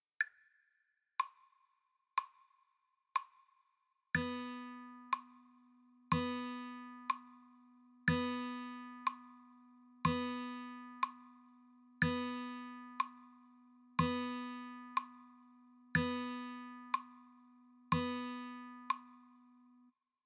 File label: half note, guitar